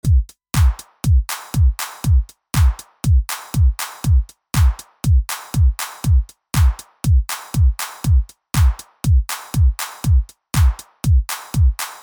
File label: Behringer Line in EQ Drum